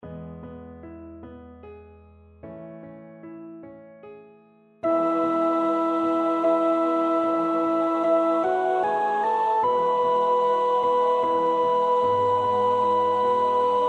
sopraan 2

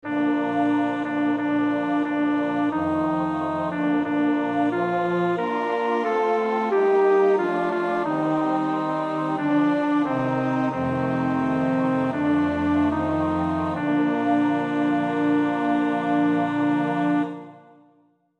bas 2, koorpartij oefening; Stainer (John); The Crucifixion

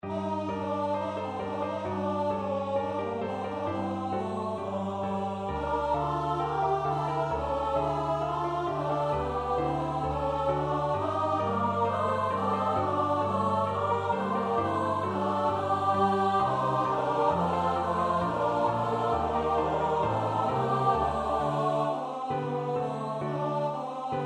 S-A-T-B, koorpartij oefening; Brahms (Johannes); Zigeunerlieder; Horch, der Wind klagt in den Zweigen

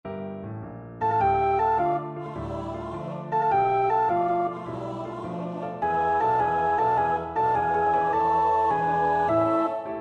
Of de groote mast, alt; 6b.Of de groote mast; Wagenaar (Johan); De Schipbreuk

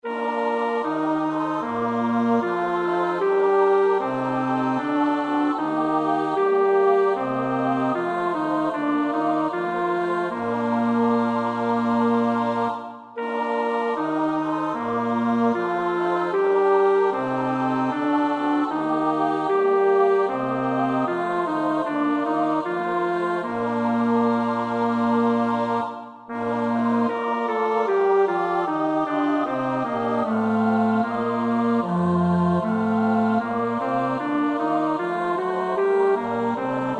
bas, koorpartij oefening; Bach (Johann Sebastiaan); BWV 164; Ertöt uns durch dein Güte

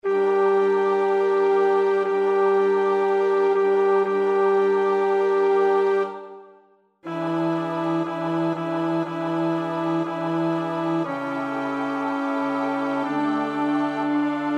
bas, koorpartij oefening; Vivaldi (Antonio); Magnificat (RV 610)

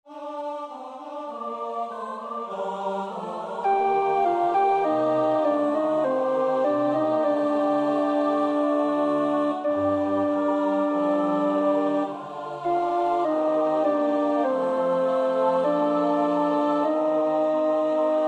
alt, koorpartij oefening; Schütz (Heinrich); Matthäus Passion SWV 479

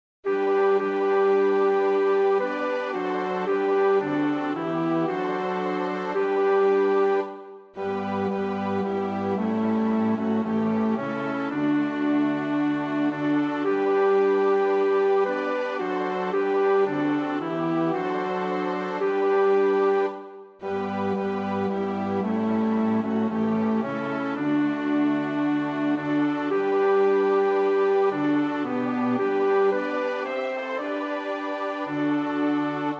bas, koorpartij oefening; Schubert (Franz); Deutsche Messe (D872)

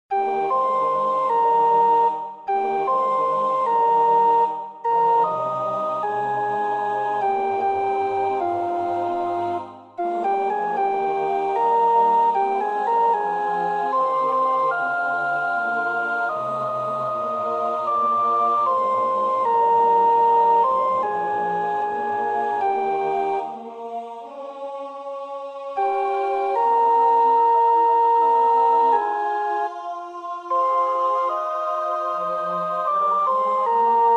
sopraan, koorpartij oefening; Elgar (Edward); Scenes of the saga of King Olaf; Epiloque

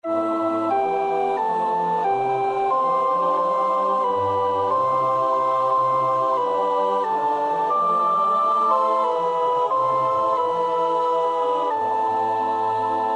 sopraan; Bach (Johann Sebastiaan)

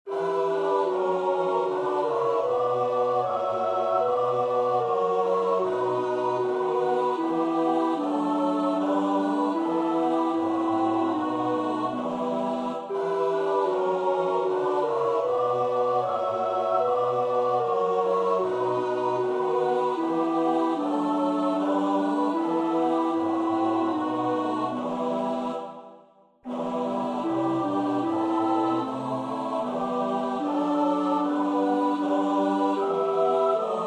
alt, koorpartij oefening; Bach (Johann Sebastiaan); BWV 158

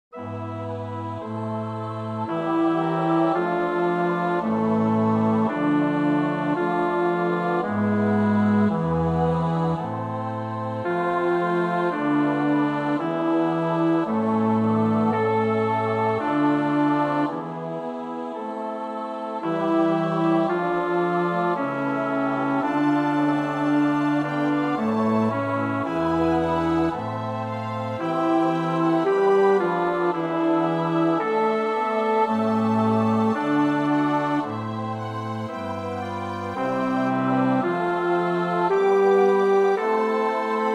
bas, koorpartij oefening; Reger (Max); O Haupt voll Blut und Wunden

